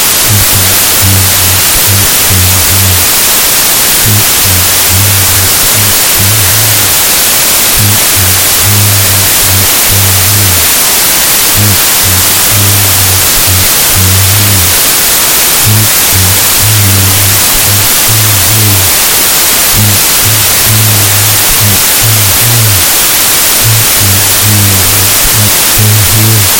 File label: thumper design jack and white noise; DIY parabolic microphone